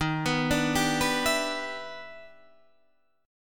E Minor 7th D sharp; arpeggiated

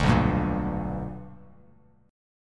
B Minor Major 7th Sharp 5th Bass Standard; strummed; JGuitar

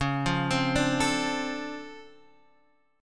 D-Minor 7th C sharp, chord; Dm7/C# Chord {X 4 3 5 3 5} arpeggiated

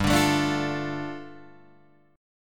G 7th Suspended 2nd G, Guitar Open D; down

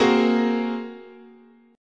A sharp 7th Suspended 2nd Sharp 5th, guitar chord

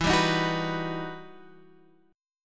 E Minor Major 9th E; EmM9 Chord {X 7 5 8 7 7} strummed

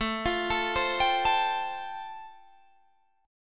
A-Minor 7th Sharp 5th, chord; 17,20,19,17,20,17; arpeggiated; Am7#5

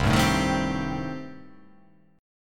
C Minor 6th C

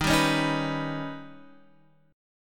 D sharp Augmented 7th D sharp, Guitar; D#+7 Chord {X 6 5 6 4 7} strummed

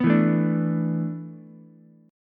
E 7th Suspended 4th, Ukulele; E7sus4 strummed; 4,4,5,5; JGuitar

